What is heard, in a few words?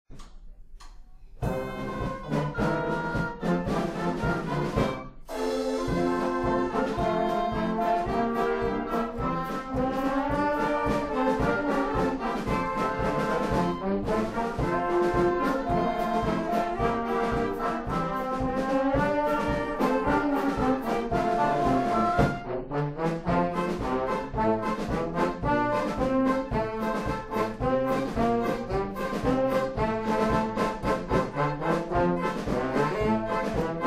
German Marches